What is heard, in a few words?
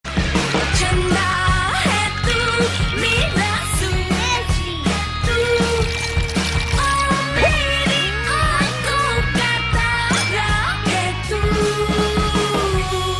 remix